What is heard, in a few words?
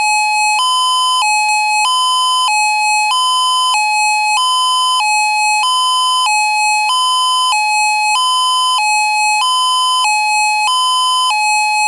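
Alternate Steady, outdoor warning siren; MOD: Modulator® Speaker Array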